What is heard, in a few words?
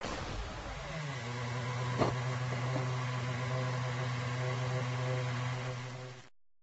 ferrari